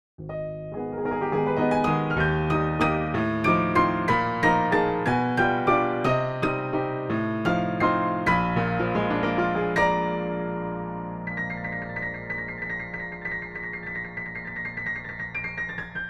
jazz piano; Chopin

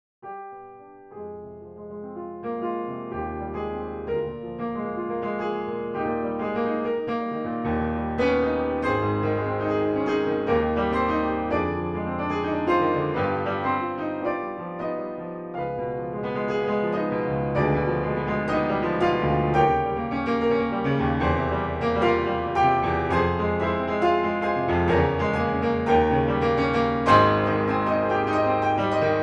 Pleyel Upright

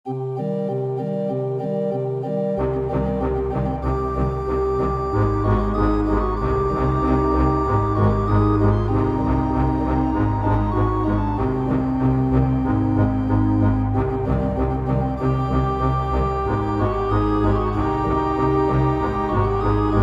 Arabic music with Organteq; Egyptian music with Organteq and Arabic music; Pianoteq

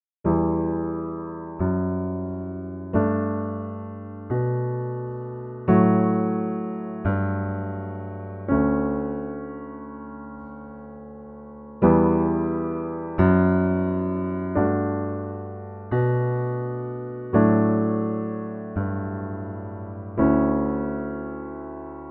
blip; Minecraft

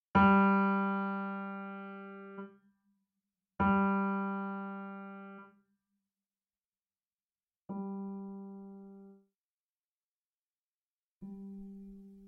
Damper noise

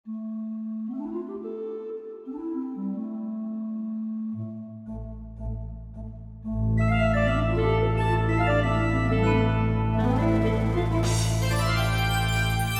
New Year Fanfare

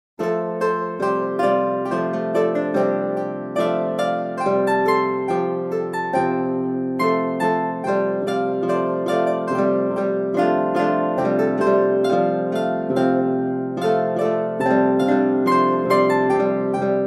Triple Harp

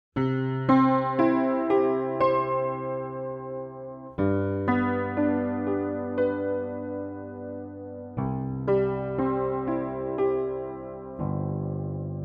Steinway Square

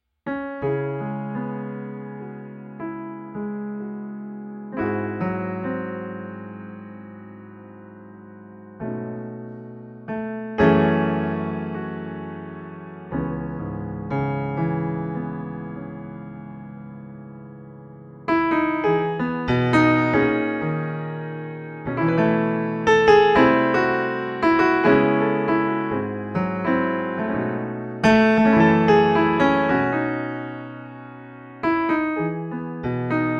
Steinway D Grand Piano

